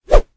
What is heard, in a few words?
gun whoosh